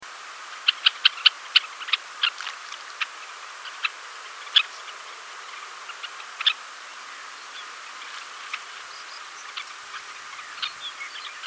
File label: Red necked phalarope